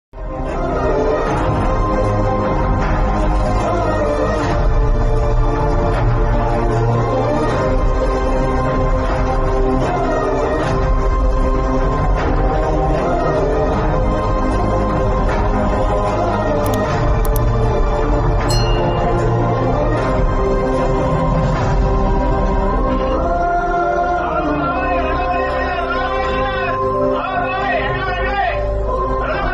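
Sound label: climax background music ringtone; rangabali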